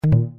multimedia game negative error; zapsplat

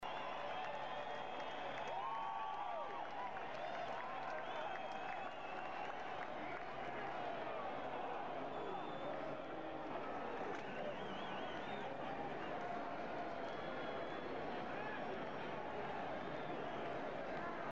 sports crowd

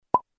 bubble Popping

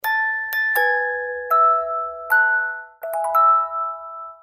happy Birthday to You end

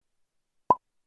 bubble Popping Sound Effect

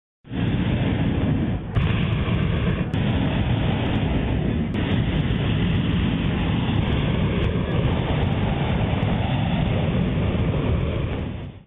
hot air balloon sound effect

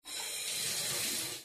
shower on run and off; short

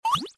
cartoon water drip slow

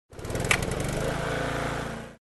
household lawnmower; zapsplat

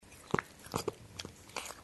cat drink sfx

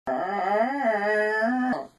giraffe sound sfx

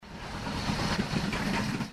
train

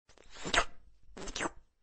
slurp

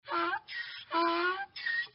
donkey sound effect slow

